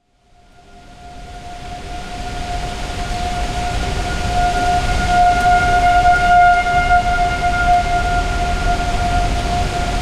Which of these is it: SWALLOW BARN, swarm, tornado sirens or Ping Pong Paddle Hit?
tornado sirens